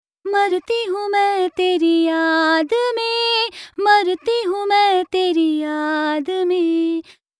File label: dbx160A Vocal